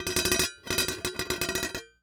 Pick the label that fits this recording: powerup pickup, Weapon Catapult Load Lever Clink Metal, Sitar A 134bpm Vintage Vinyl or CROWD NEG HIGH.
Weapon Catapult Load Lever Clink Metal